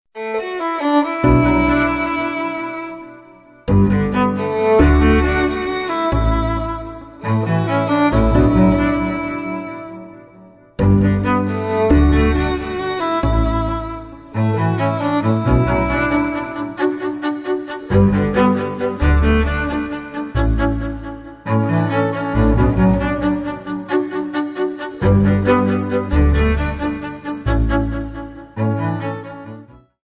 String Quartet

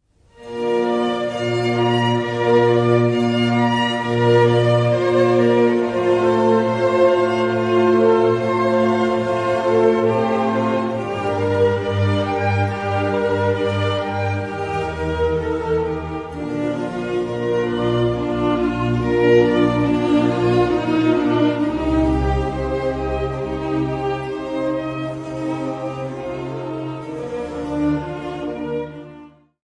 Concerto For Oboe In D Minor Adagio

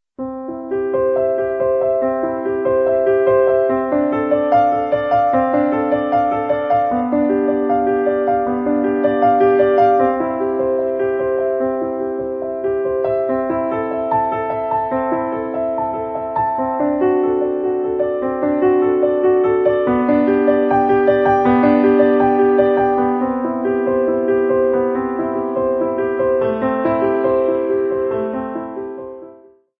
Prelude In C Major (piano)

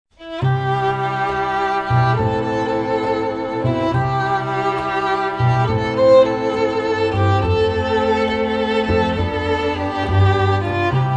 String Quartet

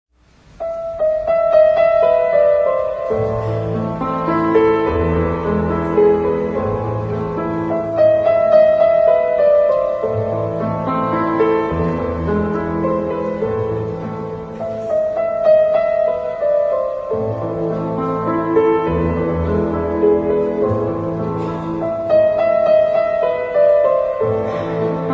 Orchestra

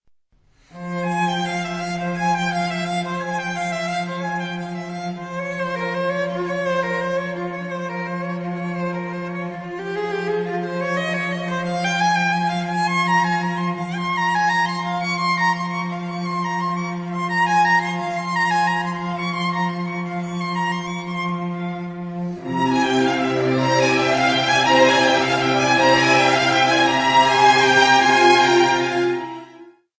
strings

